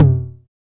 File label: mid tr 909 synth tom c key